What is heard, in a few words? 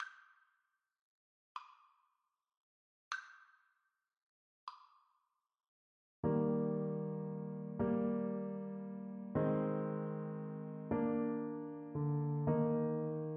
skye boat, Trumpet